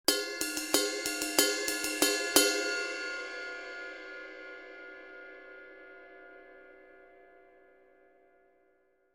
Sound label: 21in Sweet Ride Brilliant, cymbal